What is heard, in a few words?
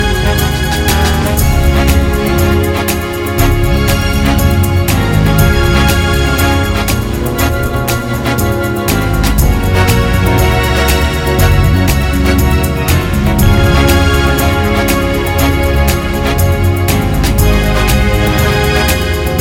Pretty and Slow Shuffle